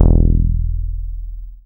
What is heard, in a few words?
BAS MKS-50; Best Service Gigapack 2